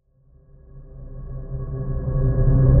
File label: Riser C; Tense; Ghosthack